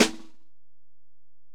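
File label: Acoustic Snare, Alesis DM-PRO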